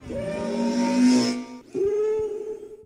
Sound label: speeder bike; star wars